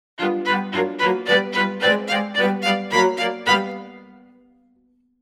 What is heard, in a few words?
Strings Quartet Spiccato Close; Sacconi